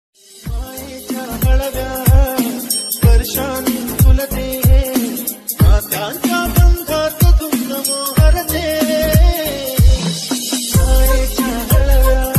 marathi song ringtone trending marathi ringtone bgm